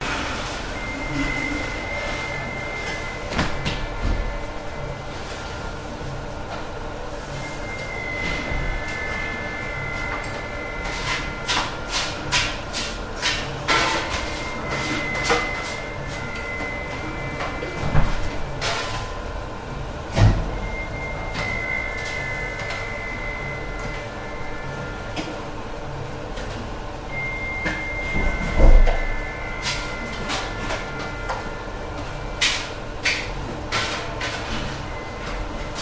Bakery Bakers Working Rumbling Beeping; German; your free sounds

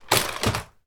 toy robot fall over; sound effects one

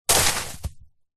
sport soccer ball hit plants bush; zapsplat

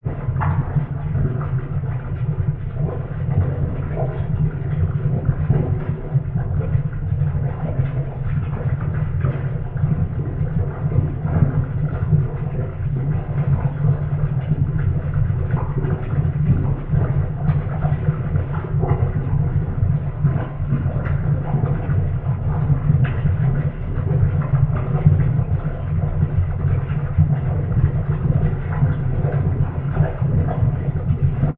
industrial water boiling in deep large container

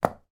toy tiara plastic drop on carpet floor; sound effects one

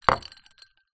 food drink glass ice liquid down table

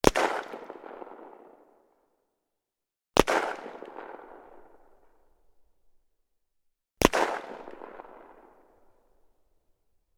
gun mosin nagant; still north media

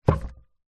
bottle wine in paper gift bag set down; foley

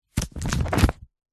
book folder plastic wallet style page turn; zapsplat

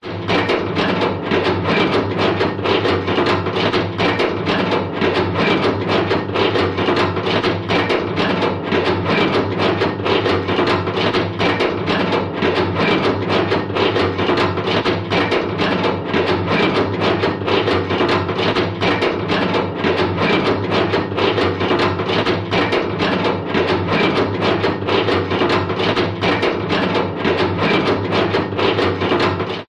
industrial metal machine operate clunk rhythm

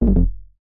game tone error

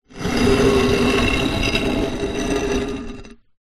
slab stone large drag on stone; foley; zapsplat

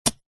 nature ice block stab dig sharp tool into single

